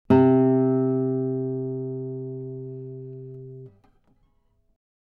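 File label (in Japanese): Nylon弦 C3 on A 指, ギター; 時間逆転音; 減衰音; ギター時間軸逆転音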